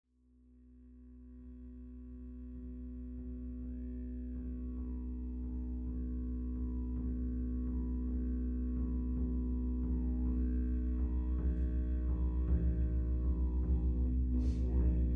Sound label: Didge and Buffalo Drum; Big Mouth